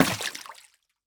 Footstep Water; Opsive; UltimateCharacterController

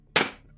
Metal Large, Dropping Items; Daz3D

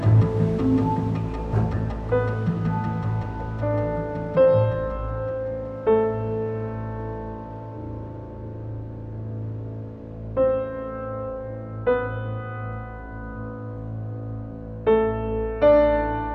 Desert, Music; Exterior; Daz3D

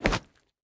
Jump Tile; Opsive; UltimateCharacterController

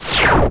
Teleport; Opsive; UltimateCharacterController

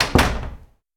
Door Close; RPG Creation Kit; RPG Sounds Pack 6; qubodup

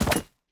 In Air Slash, Melee; Opsive; UltimateCharacterController

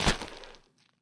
Footstep Sand; Opsive; UltimateCharacterController

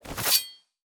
Melee Equip; Opsive; UltimateCharacterController

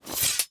Melee Unequip; Opsive; UltimateCharacterController

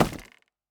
Footstep Wood; Opsive; UltimateCharacterController

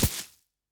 Footstep Grass; Opsive; UltimateCharacterController; Footsteps

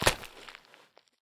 Footstep Sand; Opsive; UltimateCharacterController

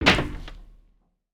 Land Metal; Opsive; UltimateCharacterController

